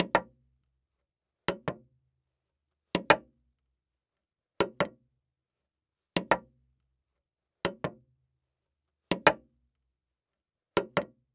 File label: Foley Footstep Cowboy Boots Slow Walking On Wood Board Walk; Foley Footsteps